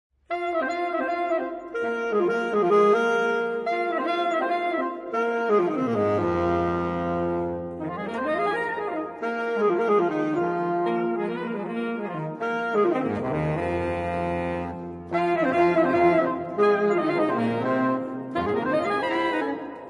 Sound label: Jazz Suite For Saxophone Quartet